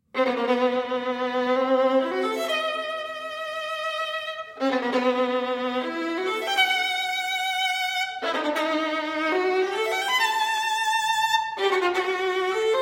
Sonatas For Violin Solo, Sonata No. 4 in E Minor - I. Allemande; Ysaye; Op. 27